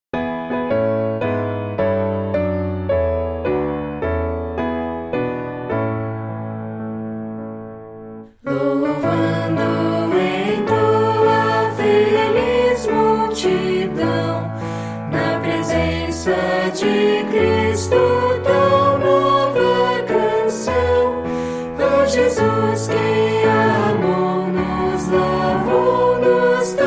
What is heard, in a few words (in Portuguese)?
voz piano